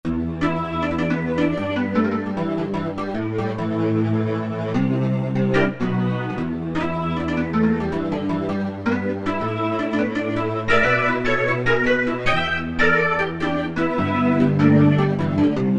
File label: Mad Organ, Roland U-220; Factory Patches